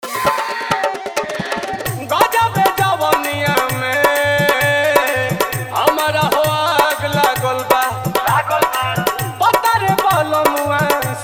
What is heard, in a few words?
Dhollki Bass Mix